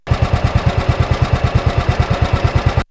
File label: Gas Guzzler; X-Static Goldmine